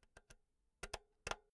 insert jack cable slow

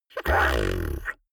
beatbox tongue roll hard damped

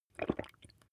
glass bottle drink swallow

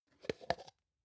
plastic can small lid open soft

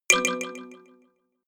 notification kalimba delay fourth

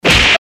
eastern fist hit seq

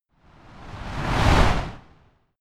torch flame whoosh

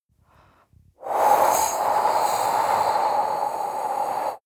breath female blow heavy

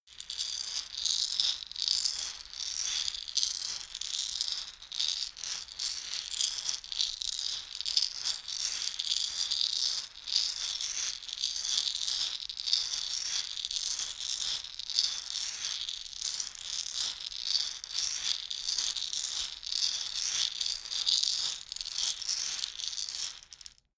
shaker wood constant rustle

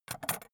scissors drop

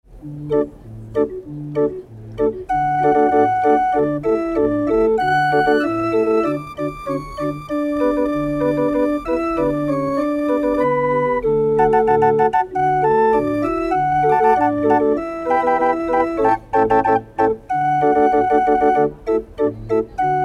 hurdy-gurdy

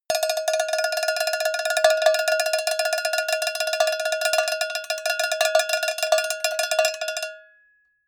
cowbell tremolo

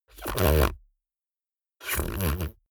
beatbox tongue roll hard

